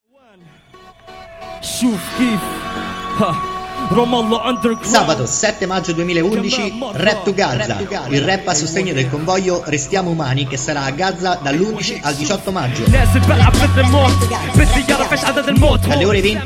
Rap

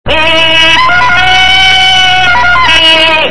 tarzan

